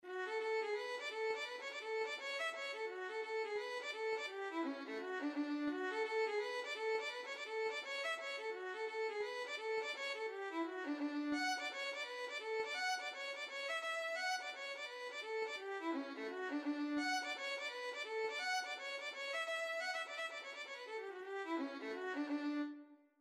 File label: violin